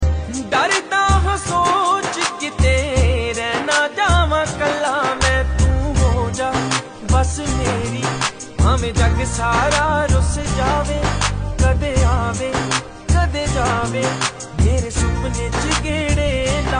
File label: Ringtone